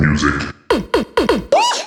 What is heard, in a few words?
House Loops, Vocal Loops House 128BPM